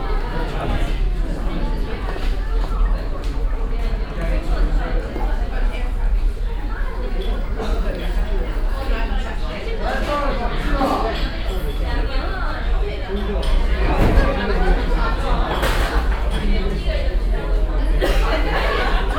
Beijing Starbucks; Sound Scapes

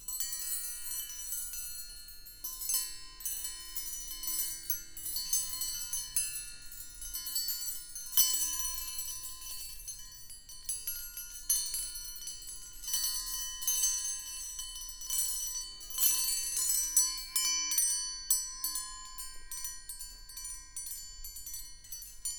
Cutlery Wind Chimes; Kitchen

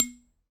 Aluminium Tube Hit

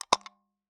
Taxi Meter

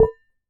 Damped Hit, Glass, Large Wine Glass

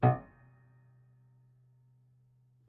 Prepared Piano

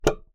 Key Noise, Melodica

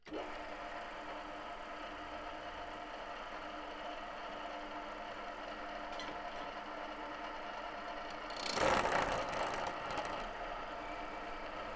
Wood Turning

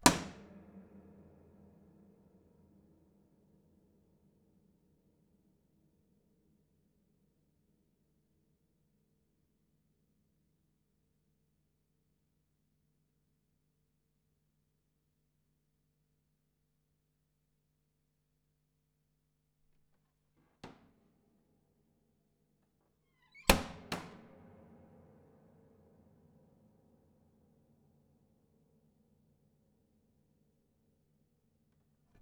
Panels Slap Sparse Ped, Piano Destruction

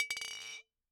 Bottle Struck

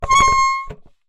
Ornament, Melodica

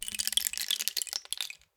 Rain Stick, Percussion Samples

